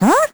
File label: teleport; Crypt of the NecroDancer